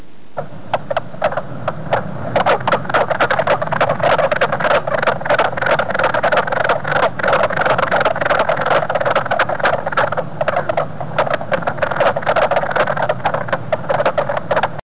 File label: mink frog